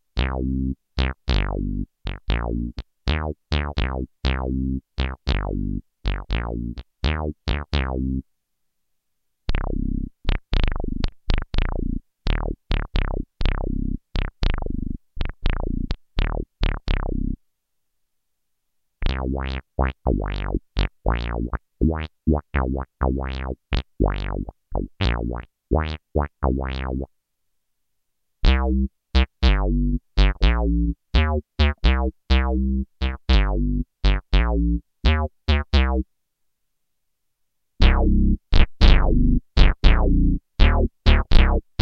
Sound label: BOSS SYB-5; Bass Synthesizer